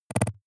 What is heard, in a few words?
noise clicks splutter low; maschinen; signale; soundpool